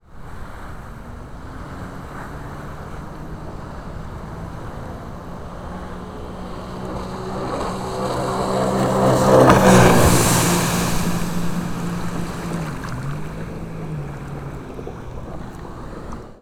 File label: Personal Water Craft Sea Doo GTS 130 Passby; Jet Ski; The Chris Alan; PWC